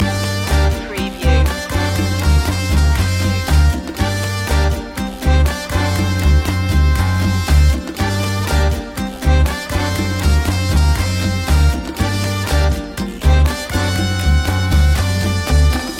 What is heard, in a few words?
cuban heat